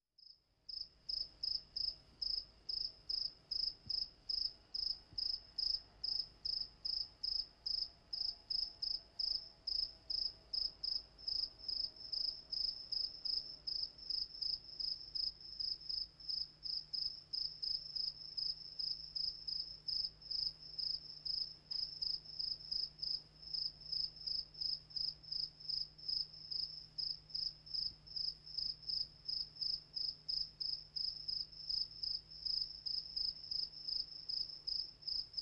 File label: Cricket Medium Cu Joi, crickets